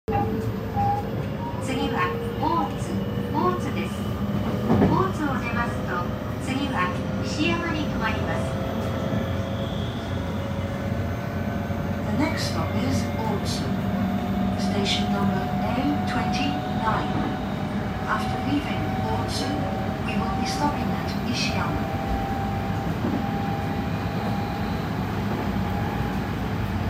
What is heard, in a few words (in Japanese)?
JR東海道線新快速山科北車内, train sounds